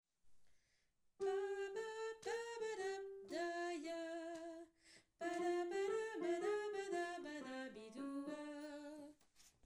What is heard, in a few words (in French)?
canon scats